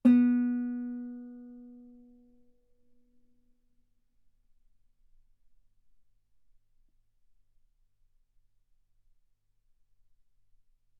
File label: Guitar ff sul B B3